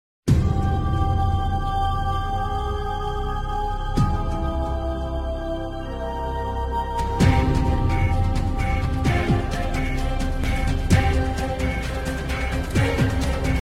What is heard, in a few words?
Running music